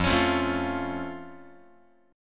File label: F Augmented F Guitar; strummed